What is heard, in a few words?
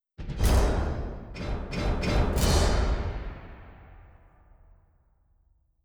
perc hits; Netease; gank